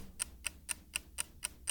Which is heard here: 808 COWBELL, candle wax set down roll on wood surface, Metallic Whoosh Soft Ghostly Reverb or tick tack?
tick tack